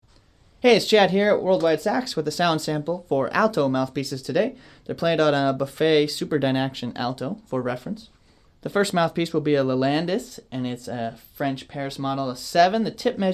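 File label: ALTO mouthpiece; Lelandais, Woodw61, meyer5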